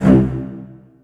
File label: marcarto, String